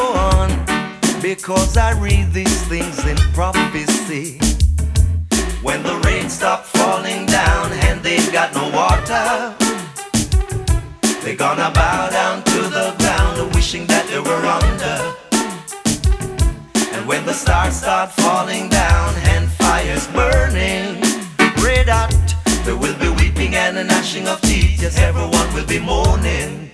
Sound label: reggae